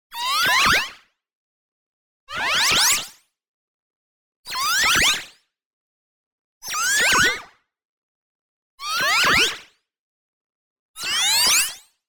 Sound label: MOVEMENT FAST Filter Resonance Delay Modulation Pitch Ascending; boom library; Anime Essentials